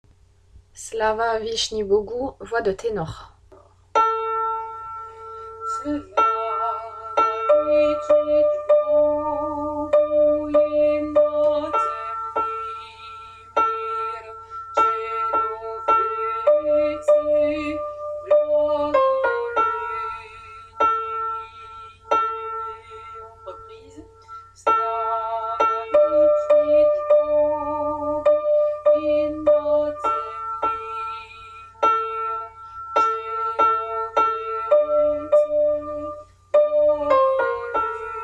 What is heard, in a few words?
tenor; slava